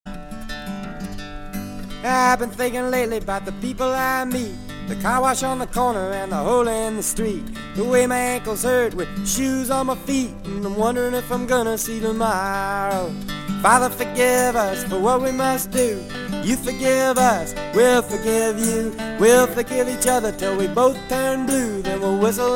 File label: fish and whistle